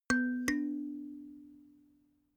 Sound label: notification kalimba third up